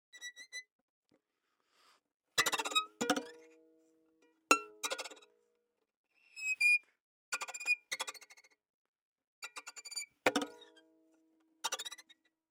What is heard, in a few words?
gettato; Baschet; Groupe Geste; Geste Recherche; Extrait Conférence